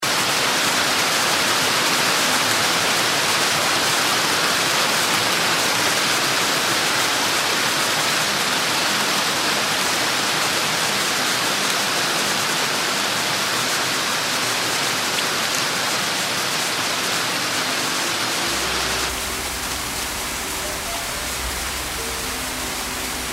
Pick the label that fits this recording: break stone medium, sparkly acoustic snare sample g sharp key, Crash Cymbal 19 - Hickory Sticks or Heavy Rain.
Heavy Rain